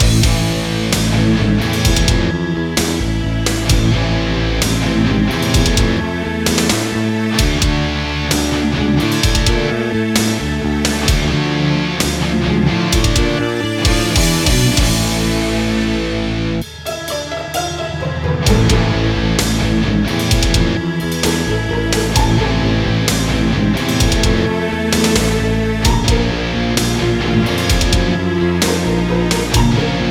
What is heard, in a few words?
LOOP